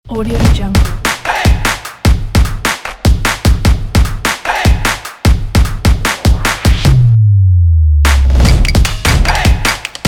percussions

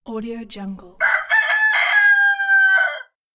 rooster

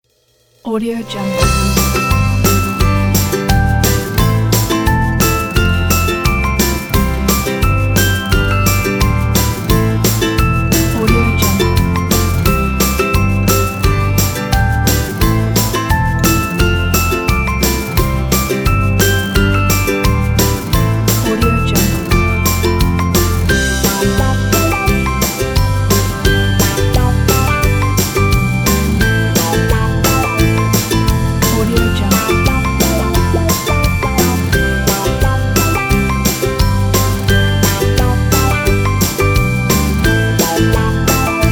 happy little game

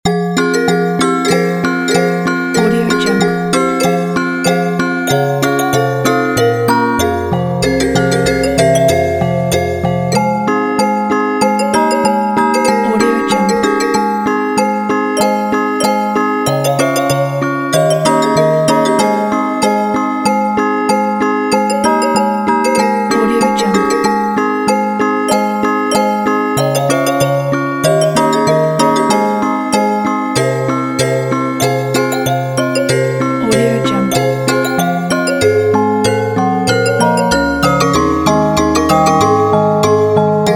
childrens fantasy; wide zone

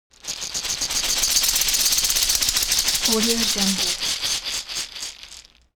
object shaker wood rough rattle dynamic

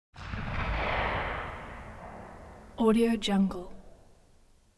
gun tails; soundmorph

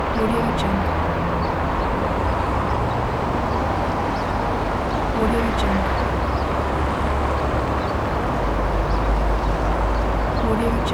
spring city traffic loop; soundcreator

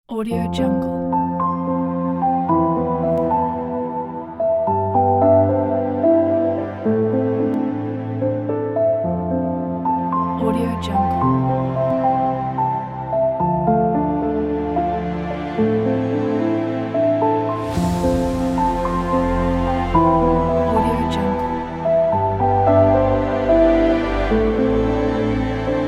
elegant romantic video; soundmake

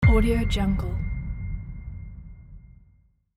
trailer piano hit

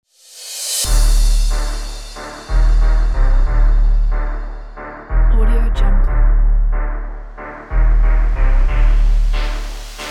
energy trap action

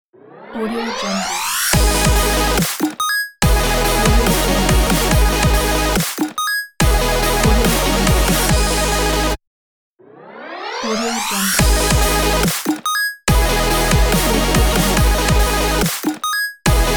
8bit dubstep open logo

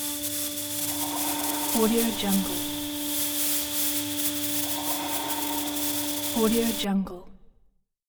scifi transmission machine ambience

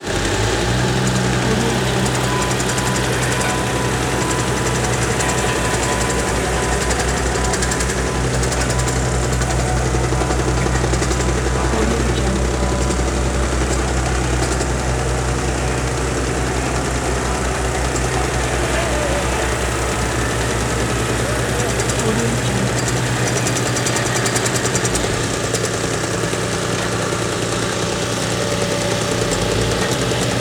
asphalt paving machine

